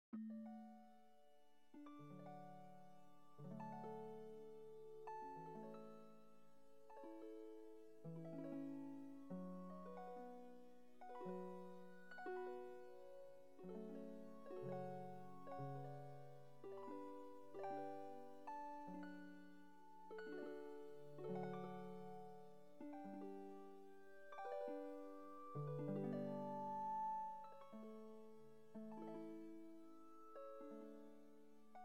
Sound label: Pachelbel canon chords